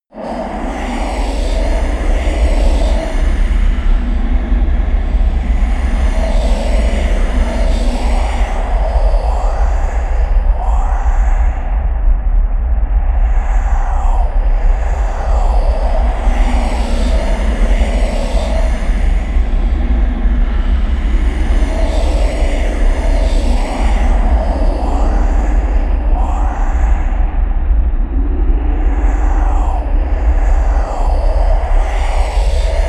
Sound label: atmosphere voices from hell, Horror